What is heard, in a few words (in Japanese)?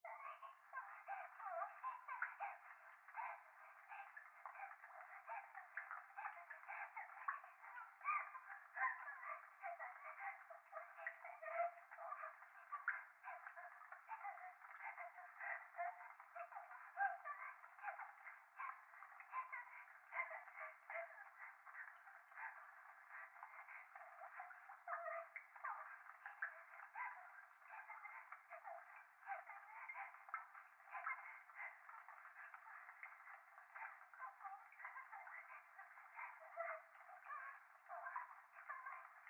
風呂 可愛い喘ぎ 雨上がり, ASMR